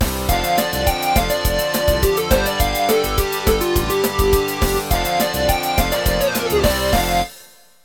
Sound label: show intro 6 bar; showdown